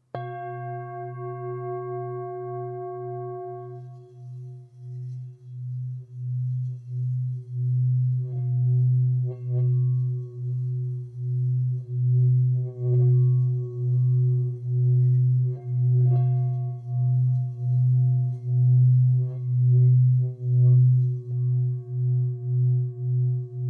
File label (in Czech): tibetska misa